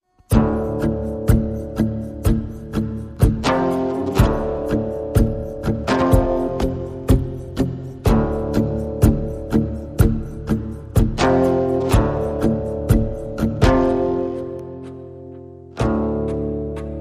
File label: Rhythm Section